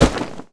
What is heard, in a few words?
DROP